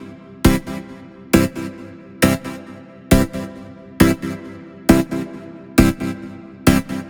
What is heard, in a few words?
Club Ch, dubstep